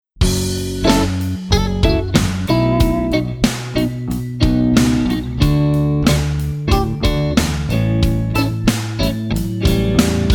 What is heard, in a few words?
Scooped Mid 2 and 3 Positions